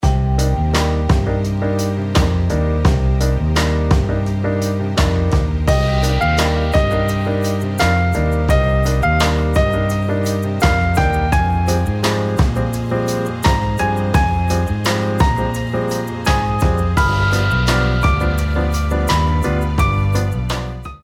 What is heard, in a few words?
Elevator Music, chillout